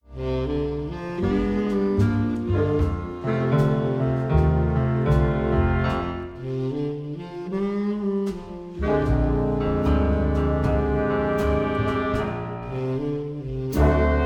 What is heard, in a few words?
Blues